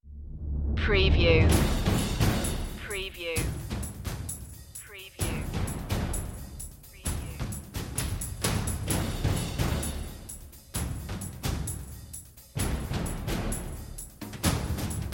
perc

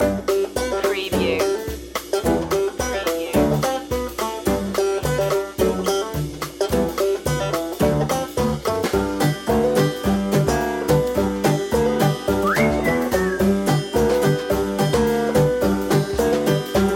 shimmy

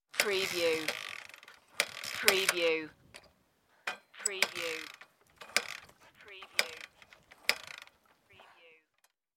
No Start Dirt Bike

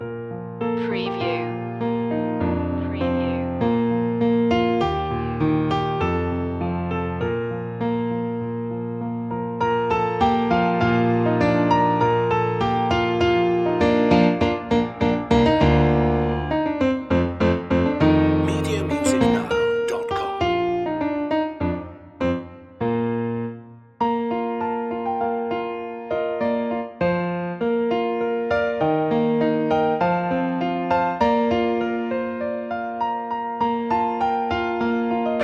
joy to the world piano loop; media music now

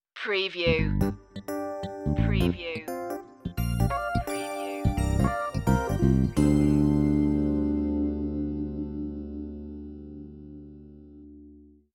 90s hip hop sitcom sting no drums

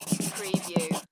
Pencil Write On Card

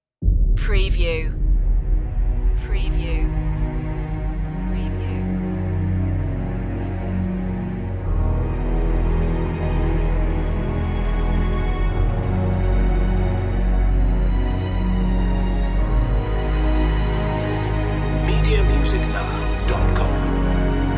underscore

